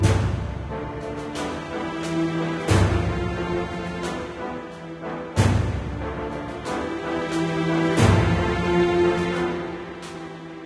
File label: Pirate; Diner Dash Flo Through Time